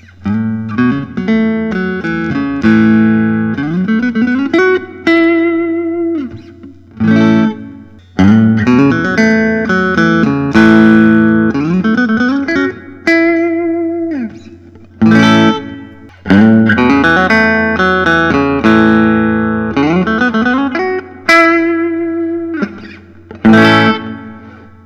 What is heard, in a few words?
fender american vintage